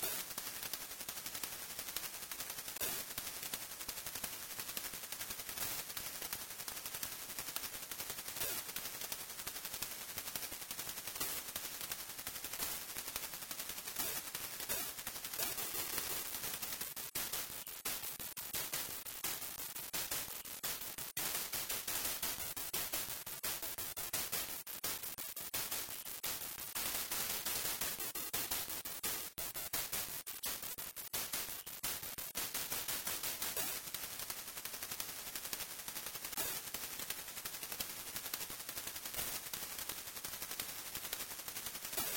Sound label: Tune; Wipeout; Cool Spot; Genesis